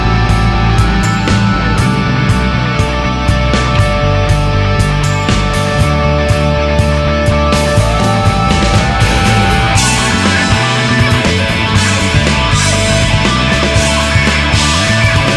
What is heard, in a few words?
instr; emu